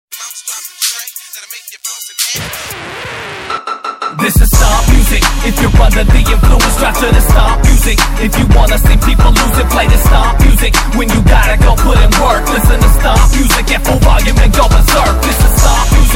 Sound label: Hip Hop Stomp Music